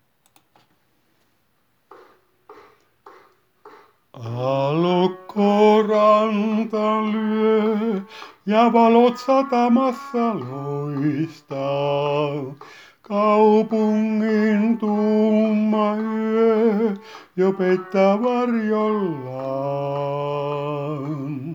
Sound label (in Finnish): Sataman valot basso